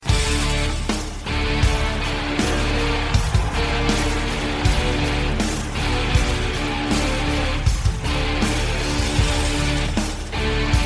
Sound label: Ringtone